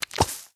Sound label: blocker octosmash tile hit; Candy Crush Friends